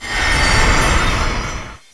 divine shield; wowmod

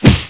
hammer; nintendomod; mario

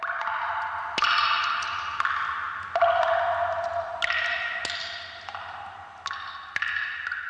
cave

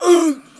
pain

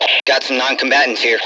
aah

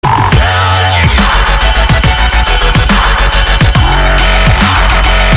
dubstep

